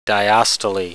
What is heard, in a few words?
diastole, heart sounds